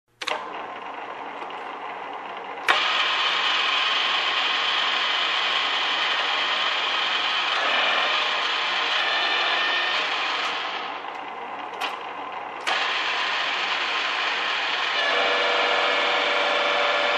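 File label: sound of metal processing on a milling machine; frezernom stanke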